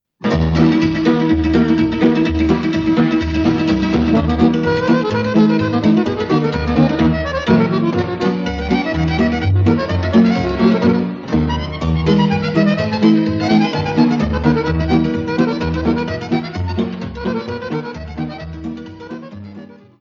Dans Tiganesc - Roumanie - Muntenie

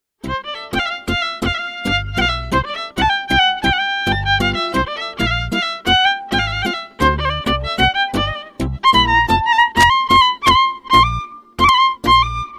Briul - Roumanie

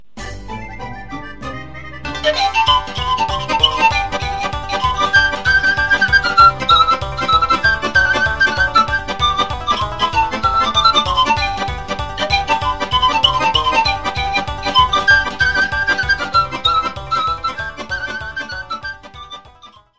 Sirba - Roumanie

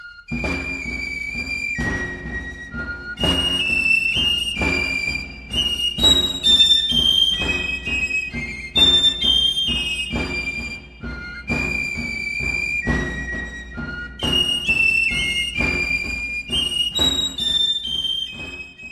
Mazurka, France, Provence

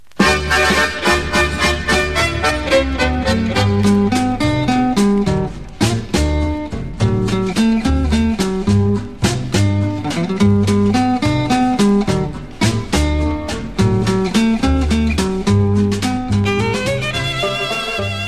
Mexican Waltz, Etats-Unis

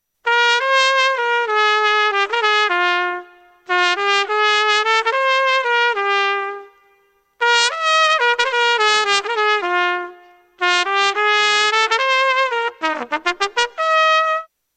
instruments cornet a pistons

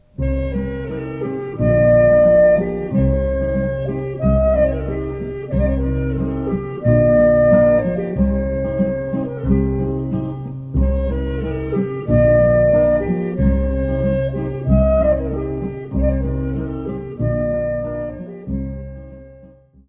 Gaida - Grece

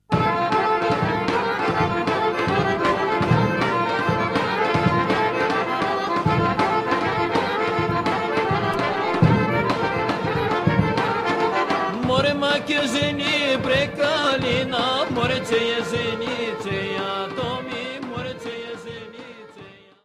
PAJDUSKO HORO, Bulgarie

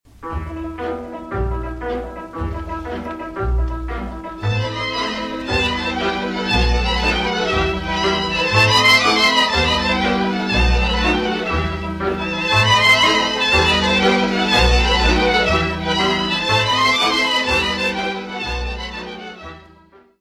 Sirba - Roumanie; Sirba Balasica

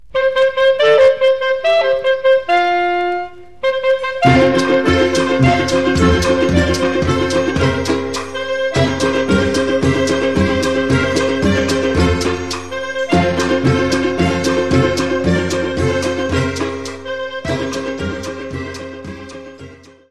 Corridinho - Portugal

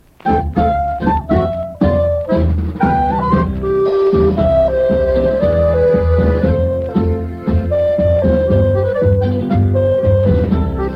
Tango - Etats-Unis

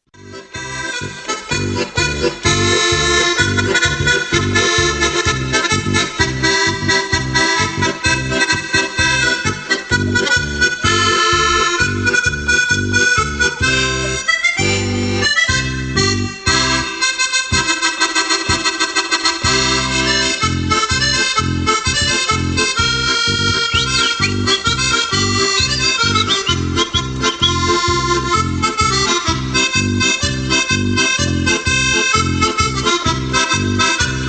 accordeon electronique; instruments

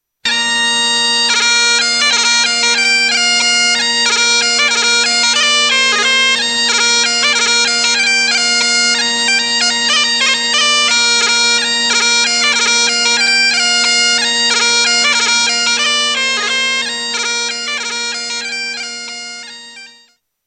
instruments cornemuse d ecosse

